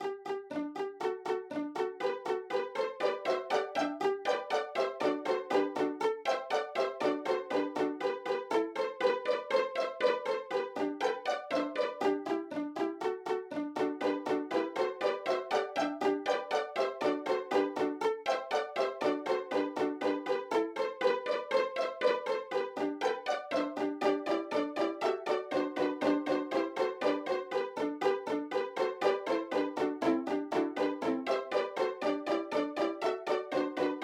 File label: O Come All Ye Faithful Bell Peal, handbell; Cmptr Gen